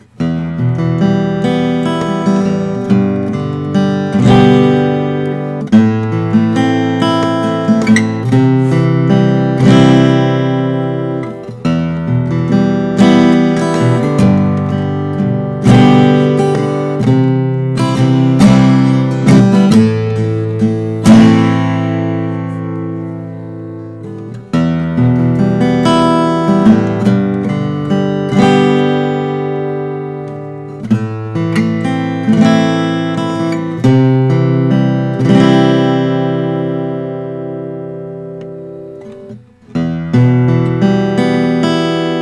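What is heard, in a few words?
martin custom 0 28 short scale sunburst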